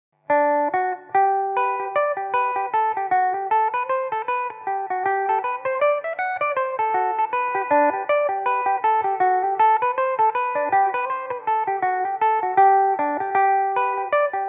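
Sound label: G major, Irish mandolin; im waiting for you; belmuse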